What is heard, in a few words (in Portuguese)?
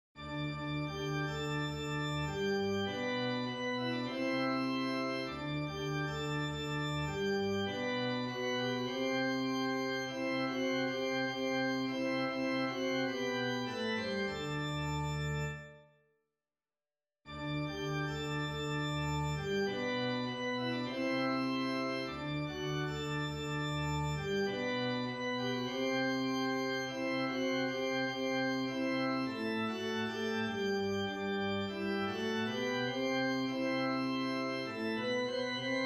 orgao